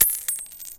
coin drop; fidget spinner master

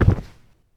fails; jump box hero

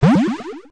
jump; gingerman rescue

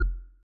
click; fruit master

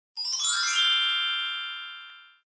complete; bffs dark academia winter outfits

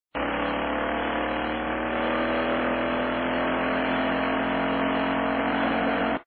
engine; road racer

